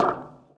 bleacher step; Golden Crayon Sounds

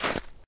snow step; Golden Crayon Sounds

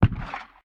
remgun fire dist; Original TK Sounds

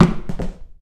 wall cone; Golden Crayon Sounds

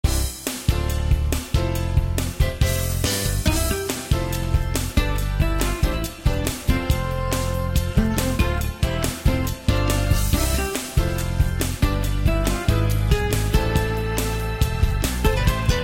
main music; RS Games Sounds; Uno

